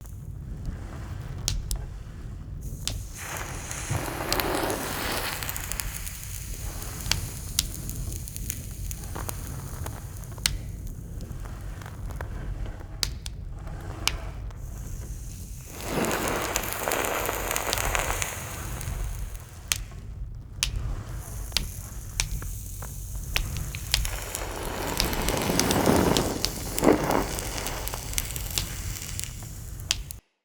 Smithy Forge Fire Loop; Ambience; Soul Plane

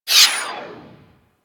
Skate Stride; Warframe; Corpus Modular Crewman; Skate Movement

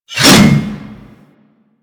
Axe Swing; Warframe; Weapons

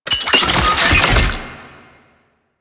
Scan Pillar Plant Open; DE; Warframe; Warframes; Frumentarius; ScanAbility

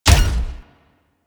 Step Small D; Warframe; Corpus; Jackal Redux

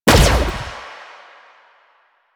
Fire Shot; Duviri; Incarnon Adapters; Incarnon Sicarus Prime; Weapons

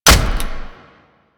Inf Punch Impact Flesh, Infested; Warframe; Weapons; Inf Kick Punch

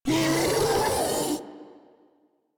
Vocal Attack; Warframe; Proto Infested Ancient

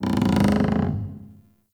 Cave Ocean Dock Creak; Ambience; Midrath Island

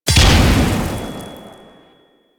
Flame Spear Throw Explosion; Grineer

